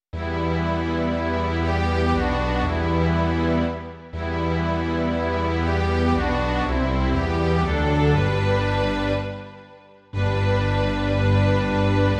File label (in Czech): smyčcový orchestr; Slunce za horu zapadá; Imaginativní verze